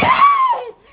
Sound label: sample, male laugh